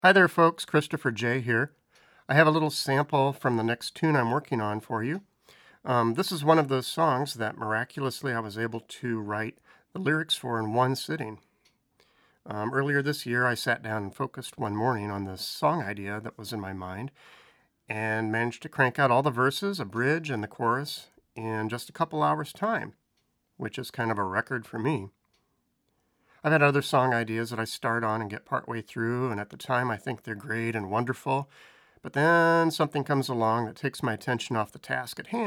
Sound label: Drums and Bass